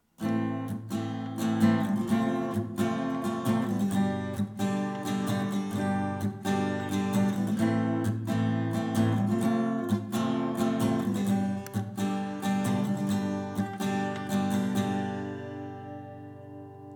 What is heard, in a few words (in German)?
i VI VII A Moll